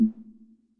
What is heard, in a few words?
scrub, Yamaha Tenori-On; Scrubkit